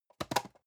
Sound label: storage fall table soft, box; objekte